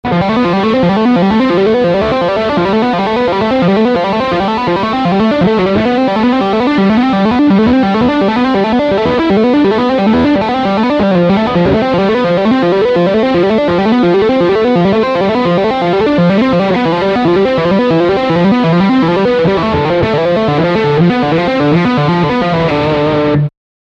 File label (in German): Tapping beide Humbucker